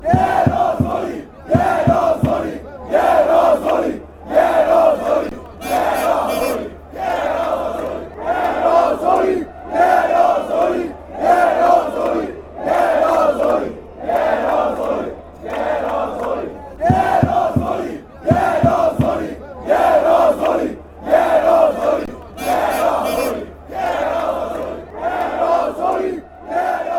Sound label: hungary chant